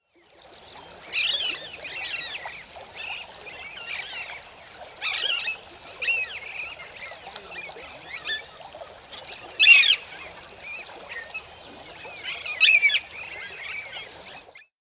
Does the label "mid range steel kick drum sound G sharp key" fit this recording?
No